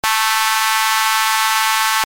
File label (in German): saegezahn 220 hochpass